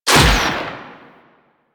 Missile Shot; DE; Warframe; Weapons; Archwing; Powers

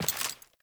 Steps

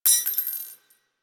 Belt Fed Rifle Links; Weapons; Warframe; Tenno; Frumentarius

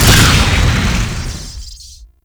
Shock Rifle Fire Alt

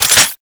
Reload, Weapons; Strun Prime

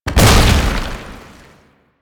Tank Infested Melee Impact; Enemies; Abilities; Warframe; Lasrian

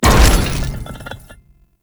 Loot Crate Large; Tau; Tau Circles Of Hell; Loot Crates

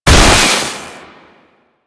Purification Weakpoint Destroy; Warframe; Nineteeen Ninety Nine; Infested Survival

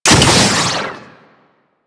Heat Gun Fire; Grineer

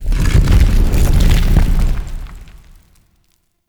Boulder Start, traps; Objects; Champion Crypt

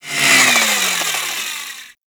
Space Pod Melee Arm Stop; Grineer; Vehicles; Warframe

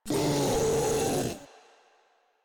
Infested Ancient Vocal Attack

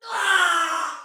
Death Soldier Female, Battle Barks; Dialog; Sinecure

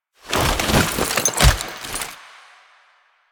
Stand To Prone, Foley; Lasrian Lancer; Warframe; Lasrian Soldiers; Stance

